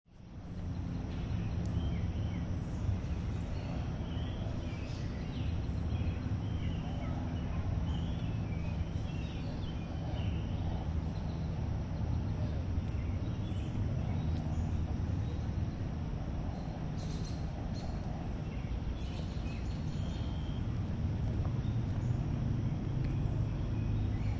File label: Central Park Quiet, Park ambience with few people; City Park - NYC, Central Park, near path. Park ambience with few people occasionally jog, walk or bicycle past on park path.; People Sound Effects; LynneMusic